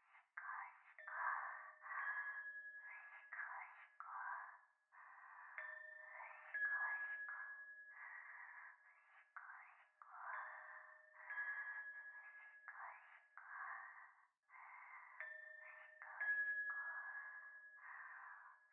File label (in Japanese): 風呂 しこしこ 神社